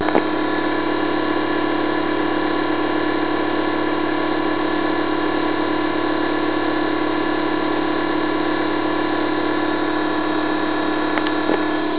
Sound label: Pump Sound; fluid